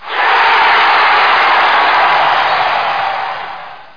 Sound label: GOAL; SOCDEMO